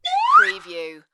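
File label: Slide Whistle Rise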